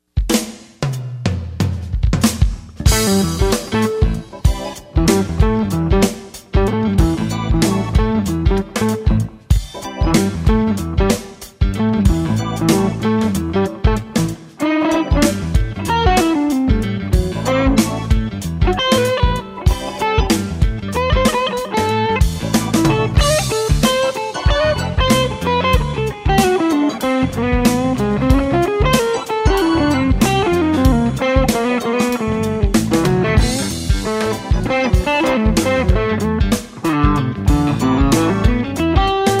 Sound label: Sample Funk Jazz; Fuchs